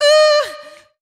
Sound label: "ooh" SUNG; ooh ahh